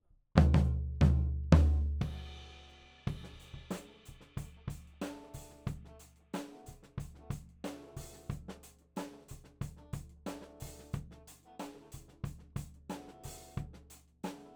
Floor

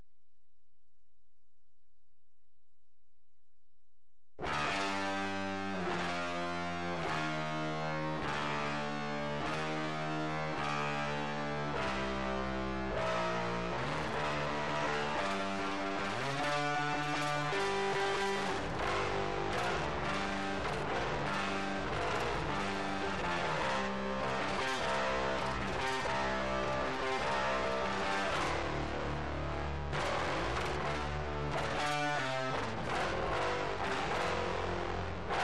bass

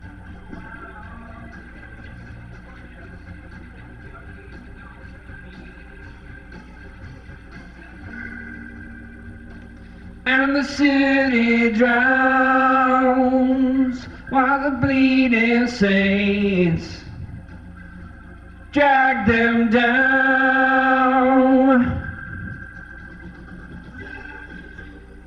VOX Leslie

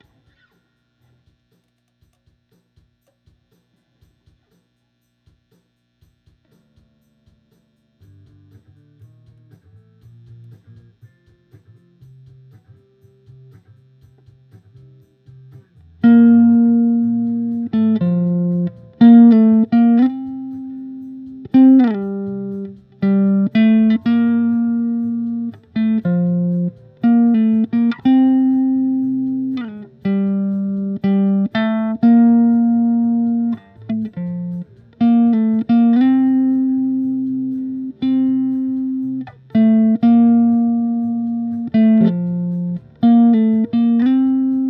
Bass

